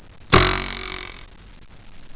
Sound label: SPRING